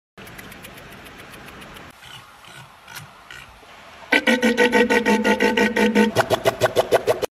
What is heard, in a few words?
Walrus